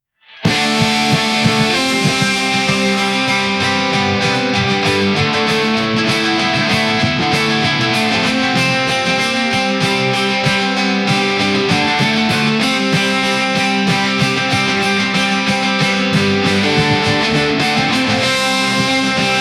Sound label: a very good and high qual, punk rock